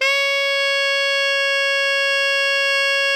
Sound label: ALTO FF C#4, saxophones; Greytsounds; Philip Wolfe David Crozier's Saxophones